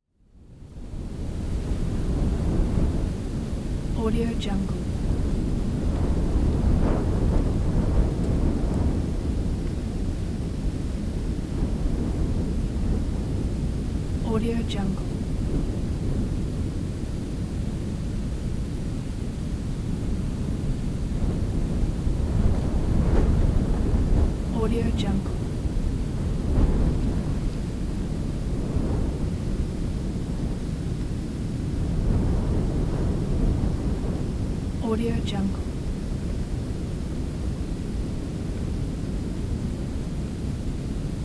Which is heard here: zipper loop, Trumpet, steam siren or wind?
wind